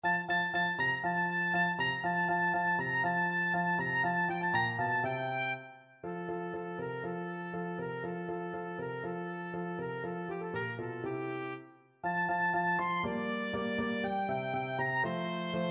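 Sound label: autumn 1st movement, oboe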